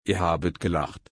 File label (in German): lachen